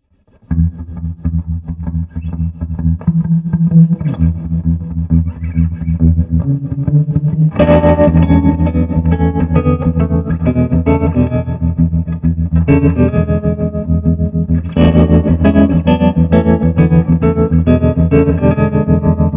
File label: SG trem dirt clean; swart ast pro